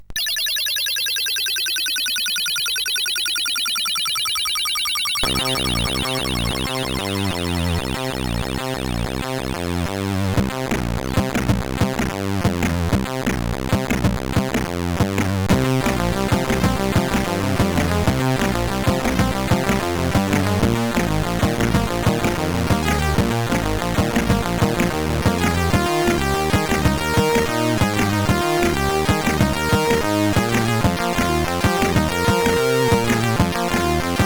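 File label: Munch Load, MOS 8580 SID chip music, games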